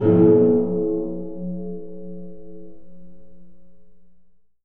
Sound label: SOFT MALLOT; Rarefaction; Poke in The Ear With A Sharp Stick